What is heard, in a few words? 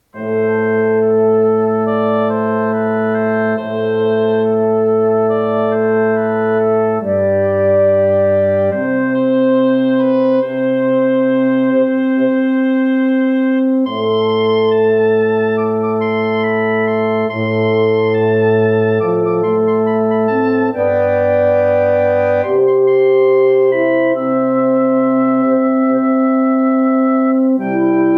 FF Wind minus Flute